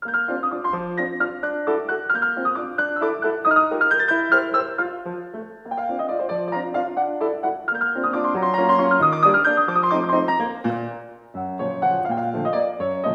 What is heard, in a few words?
waltz